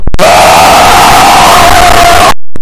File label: raaawk